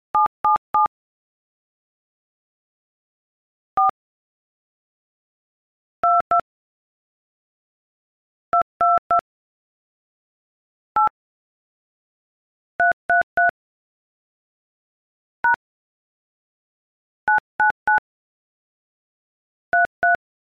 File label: hmm